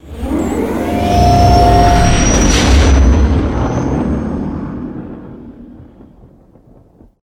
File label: Bonus Warp; Angry Birds Star Wars; android game; Ab Space